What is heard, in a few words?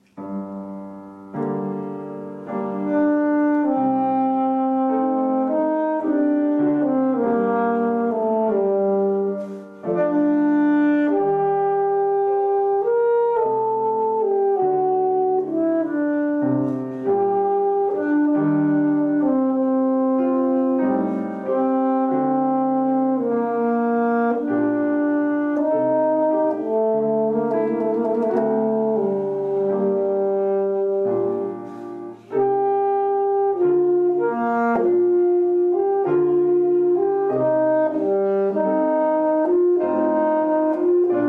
Sonata; Solo Accompaniments; Alto Sax; Eccles - Sonata